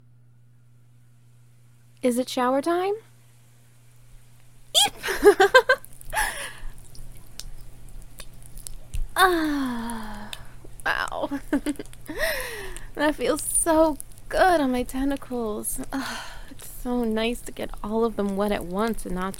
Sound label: Wet Noises; Keeping the Kraken Wet